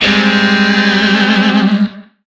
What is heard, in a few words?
Shawzin Min Chord; Warframe; Lizzie Shawzin; Chords